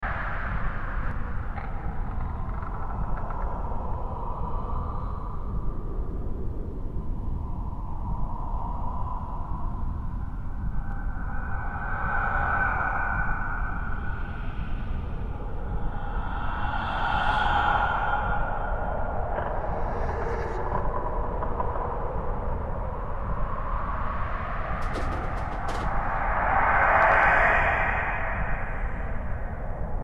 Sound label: dark ghostly visitors